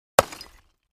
Clay Chimney Wall Hit, concrete & brick

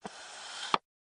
Camera Turn Focus; shutters & movement

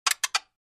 Flash Gear Four Stagg, flash gear

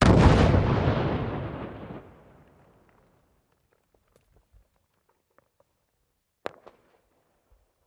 1x16oz 1x4oz 2x2oz B

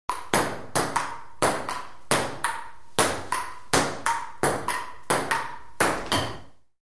Ping Pong Ball Hit

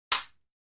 Fencing Sword Hit

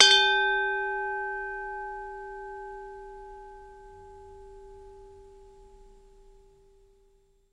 Champagne Toast Ding, glass clinks

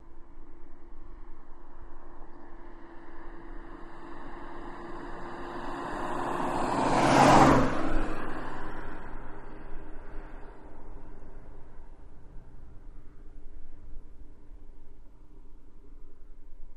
Mustang Medium Fast By Highway, Very Long Tail

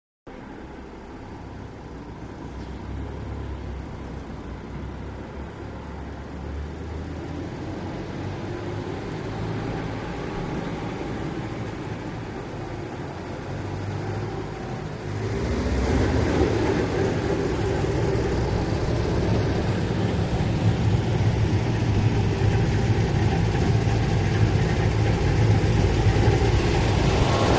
Zamboni By Ice Lft Rt, Hockey Ring; Hockey Ring Ice Finisher, Engine Idle, Close Perspective. Arena.

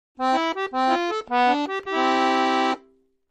Accordion Dominat; keyboards

